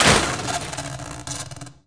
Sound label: item electronics break